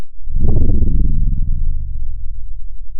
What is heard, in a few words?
pop, Sound of a dinosaur